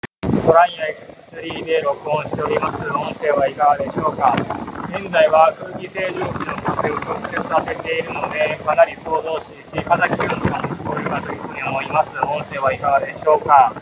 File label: Tranya X3 wind